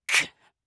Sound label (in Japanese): クッ…！